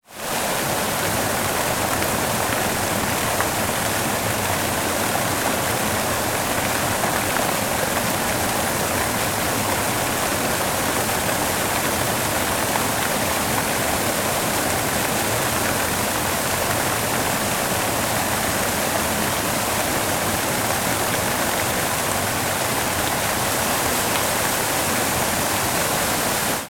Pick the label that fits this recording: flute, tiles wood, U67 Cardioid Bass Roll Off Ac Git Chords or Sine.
Sine